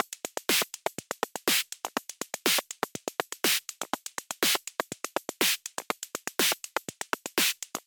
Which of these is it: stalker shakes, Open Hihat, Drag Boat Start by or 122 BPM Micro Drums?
122 BPM Micro Drums